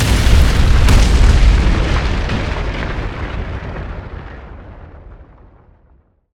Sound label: boom dust